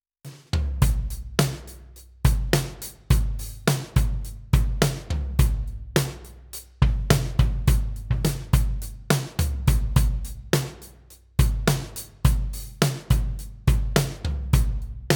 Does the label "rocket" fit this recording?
No